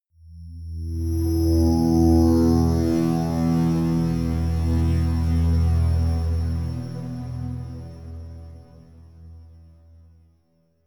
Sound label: scrapes ringing blitter harmonic